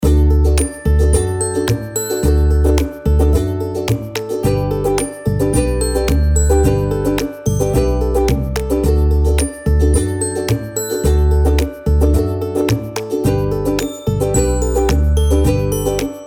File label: happy kids background music